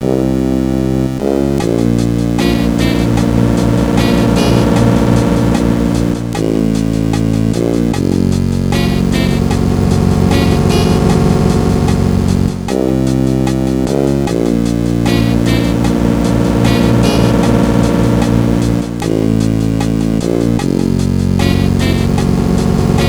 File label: Suspense; Mario & Luigi: Superstar Saga